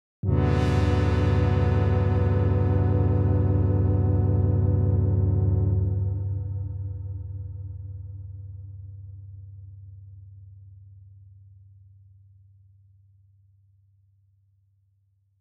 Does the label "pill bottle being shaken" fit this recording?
No